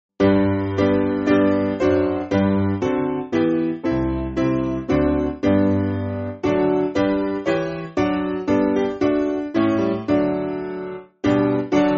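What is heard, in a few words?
Piano, Music Backing Files for Churches; We Praise You Lord; Howard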